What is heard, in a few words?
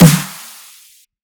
snare dubstep